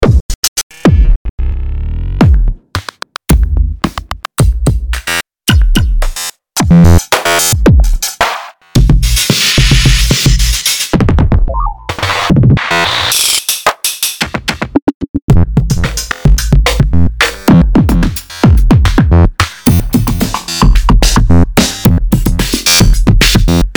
GLITCH DRUMS

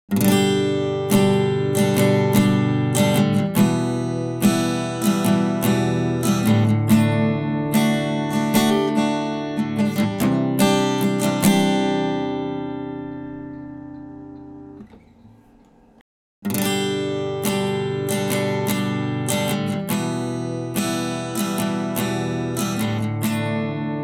Ac Gtr 6 Str EQ Comp

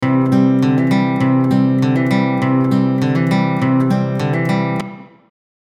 AC Gtr Small Wide Chamber

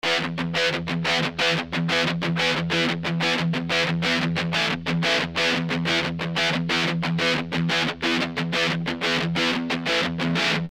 Stereo El Guitar After Clean

